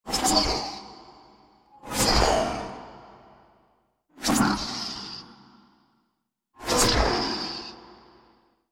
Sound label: Processed Magic Whoosh; Xayah